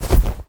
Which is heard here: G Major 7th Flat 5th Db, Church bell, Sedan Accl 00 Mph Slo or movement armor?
movement armor